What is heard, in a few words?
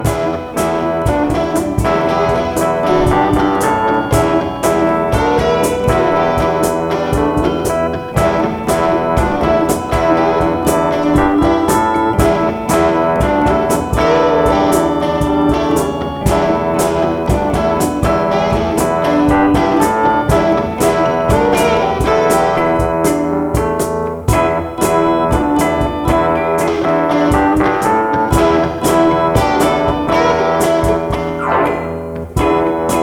Funky Jam